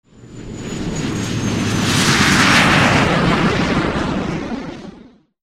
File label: flight